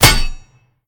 hit; smite; gilgamesh

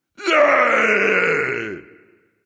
nv yay; Smite; ymir skin9

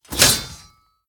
defeat kick sword; smite; god arthur

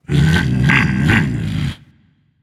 tongue; smite; zeus

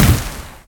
impact fire; smite; god merlin

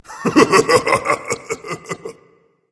laugh berserk; cuchulainn

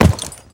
horse hoof; smite; guanyu

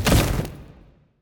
Victory Monster Bodyfall; Susano; Smite

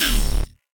debuff; Smite; god Janus

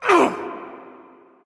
grunt; smite; guan yu

